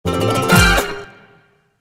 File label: (Jingle) Score Recap